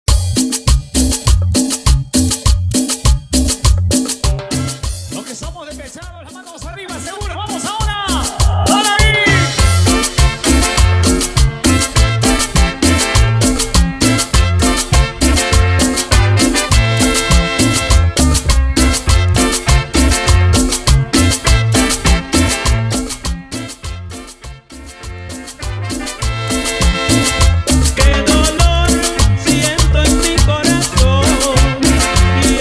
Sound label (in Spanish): intro animacion cumbia; acetato; bpm 101